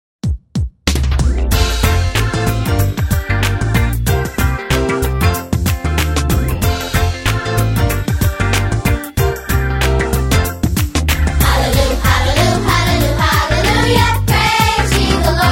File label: Children Bible Songs, Gospel